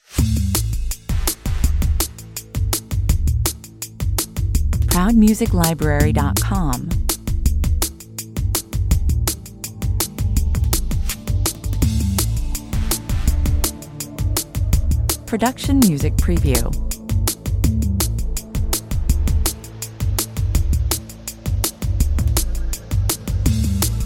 A cut-to-the-wire breakbeat track with an ominous undertone and a strong forward drive